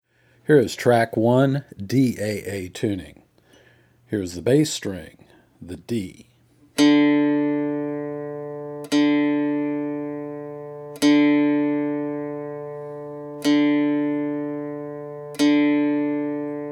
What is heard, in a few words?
daa tuning, dulcimer